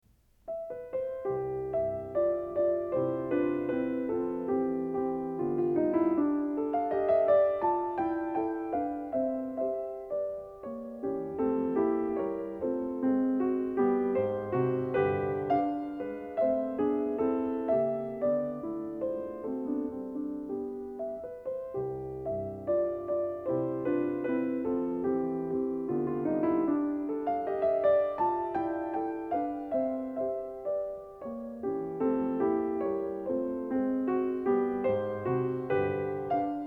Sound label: 33 Piano Variations in C; Anton Diabelli